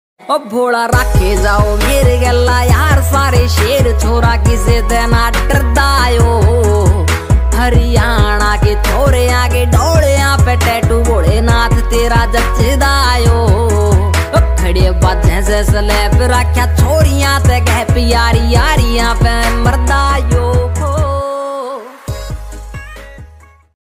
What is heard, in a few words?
ringtone